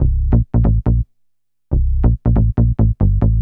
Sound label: Bass G; Techno; TechnoMusicMaker